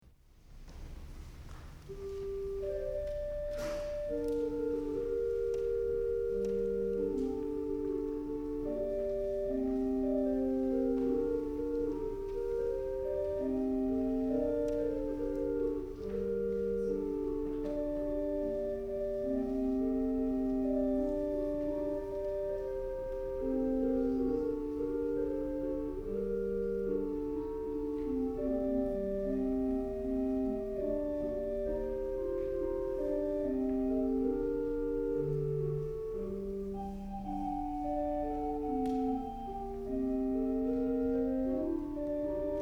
Flute Piece

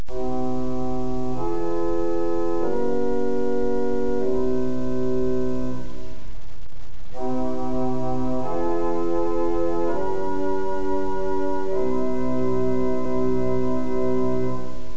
celeste, organ

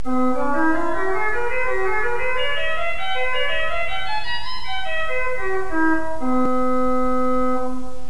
cornet, organ

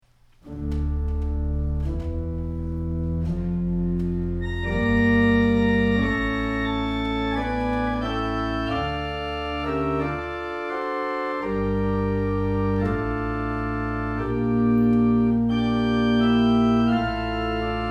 Introduction Fugue D Minor

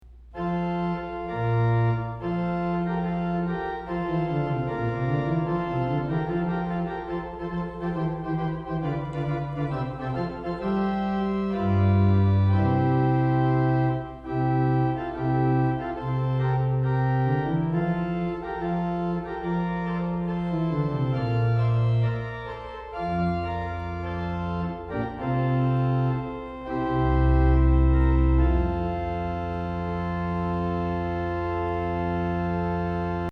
Variations to the Sicilian Hymn, organ

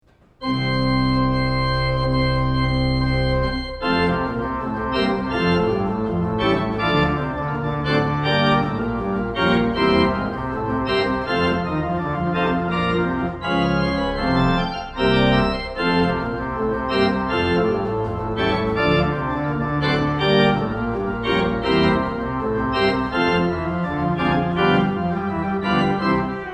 Postlude Pedal Study

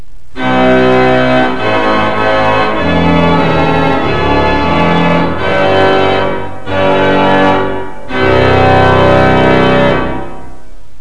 reeds, organ

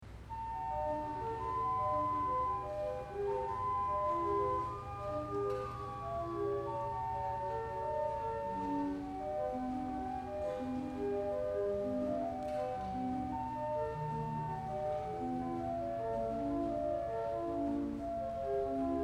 Allegro Deciso

